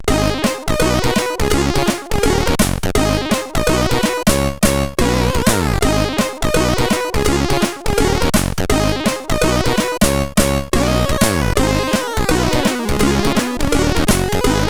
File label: Blues, CSG8580R5